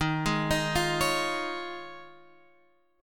D sharp 9th Suspended 4th D sharp, Guitar Half Step Down